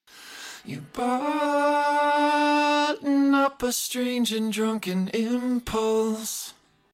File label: Double Tracked Vocals